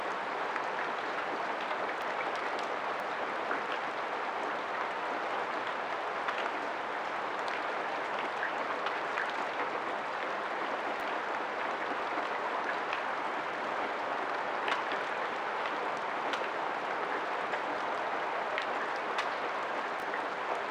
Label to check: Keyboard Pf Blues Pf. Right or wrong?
Wrong